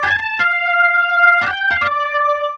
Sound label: Organ Lick; Ambient Grooves; Organs Licks